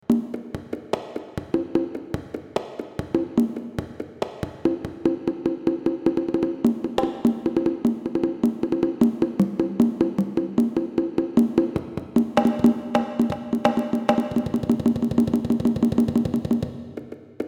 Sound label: conga